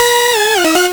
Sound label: Beat